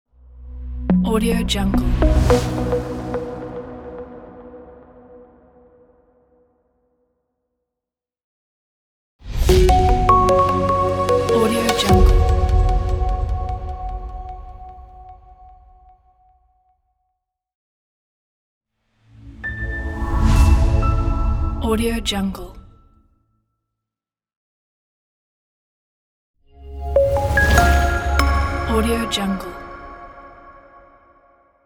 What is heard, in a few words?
logo pack; boxbeatsnoex